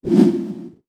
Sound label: cloak; player